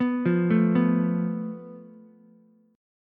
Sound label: A Suspended 2nd Sharp 5th, Ukulele; Asus2#5; arpeggiated; JGuitar